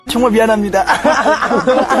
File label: laughter; alternative